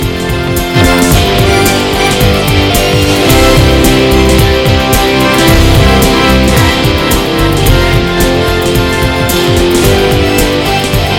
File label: Great Rock Sounds